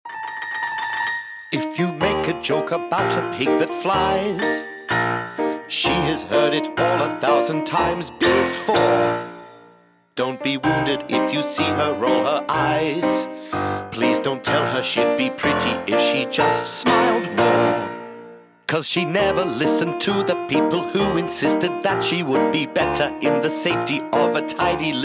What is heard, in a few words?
Song Pig Vocal; baron fur is gonna fly; switch windows xbox one